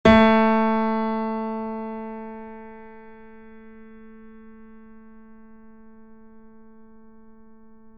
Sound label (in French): Note Piano